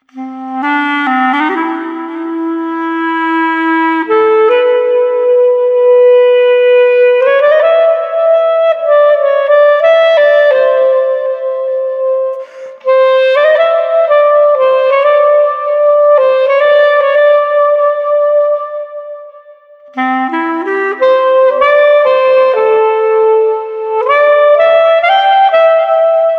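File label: Street Clarinet Airy, 75 bpm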